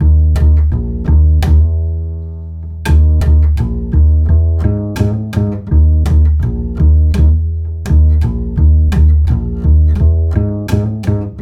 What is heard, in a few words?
Acoustic Bass